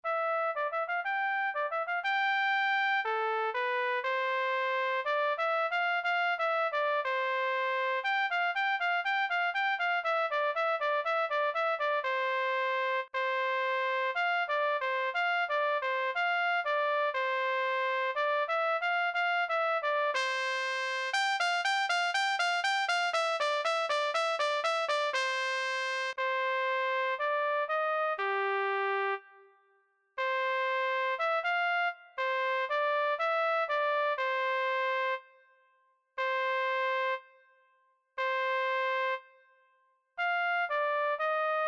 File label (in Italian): camminando tromba